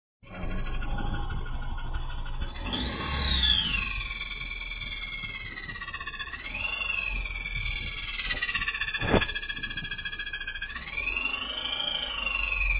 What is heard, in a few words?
panda noise, chirp noise from engine